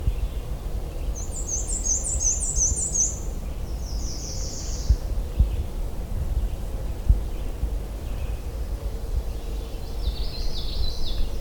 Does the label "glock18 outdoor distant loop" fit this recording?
No